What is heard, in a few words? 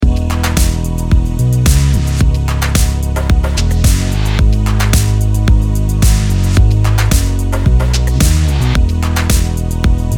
110bpm, beats